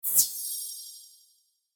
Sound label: Pixie Dust Small Fast Fly By Magical Trail; Blastwave